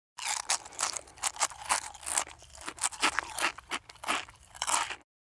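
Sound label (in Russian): мелко грызёт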